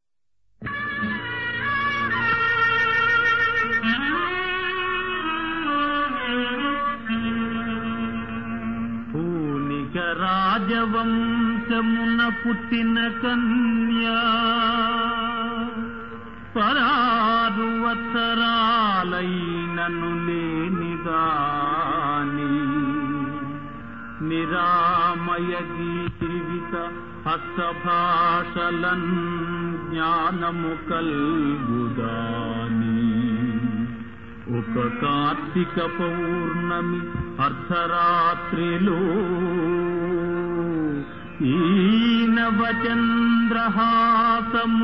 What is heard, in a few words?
Padyam; KeeluGurram